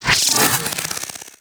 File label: Micro Spin Attack; Warframe; Corpus; Arachnoid Micro; Abilities